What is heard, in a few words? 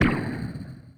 simple vaporizer vap zap laser